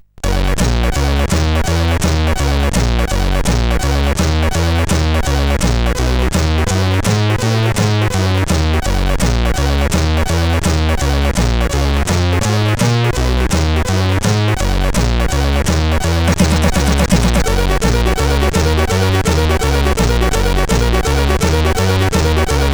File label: Rock n Roll, sid CSG8580R5